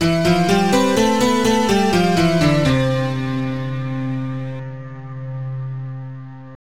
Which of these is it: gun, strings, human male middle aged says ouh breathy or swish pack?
strings